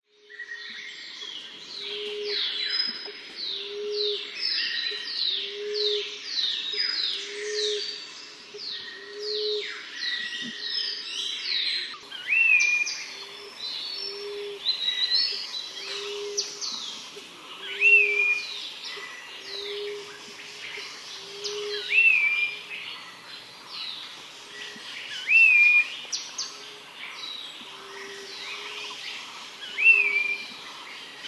lowland ambiance

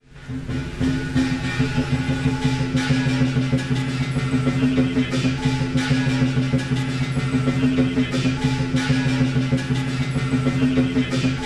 lion dance

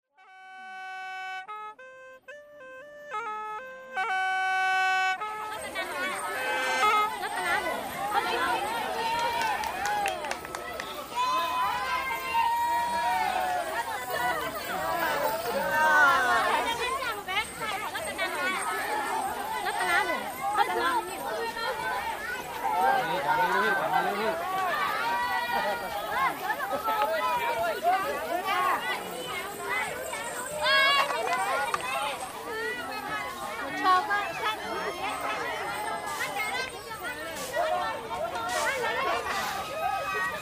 Hmong New Year

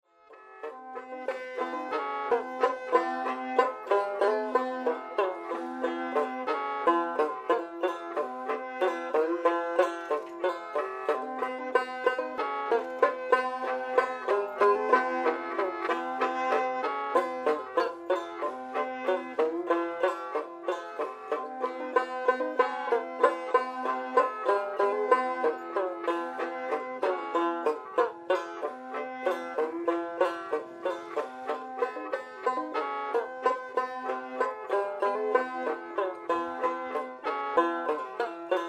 duet, tribal

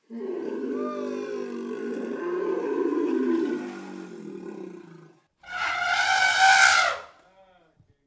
elephant

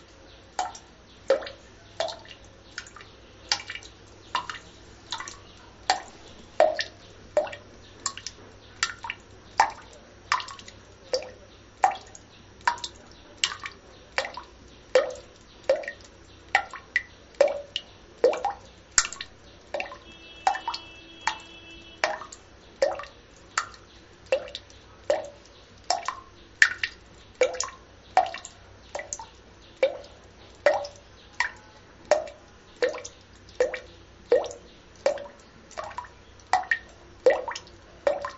toilet

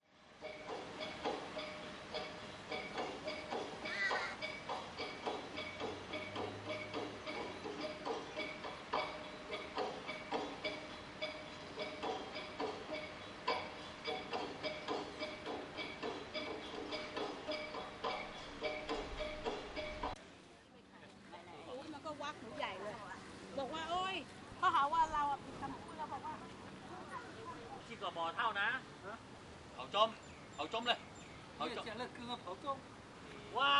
lumphini

